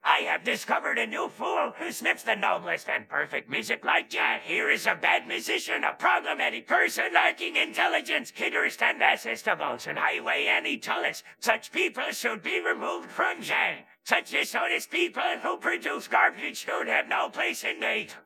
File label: jazz